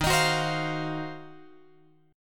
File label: D sharp Minor 6th Add 9th D sharp, chord